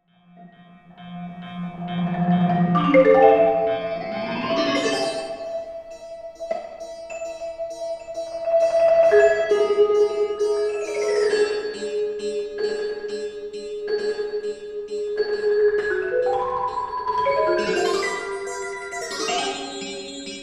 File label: Marimba And Electronics